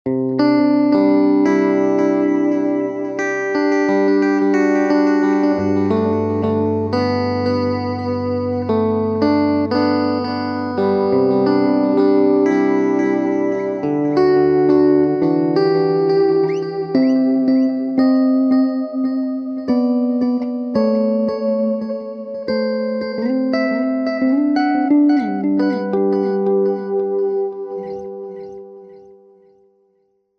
Deusenberg Outlaw Diti Techi Stomp Total Recall Delay Fender Super Reverb